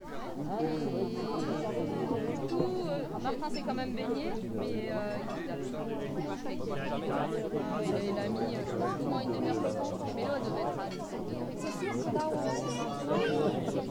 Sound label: picnic